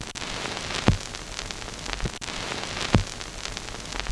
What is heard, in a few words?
Looping Vinyl Noise